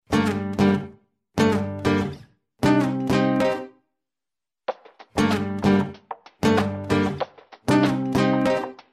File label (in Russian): gitara sms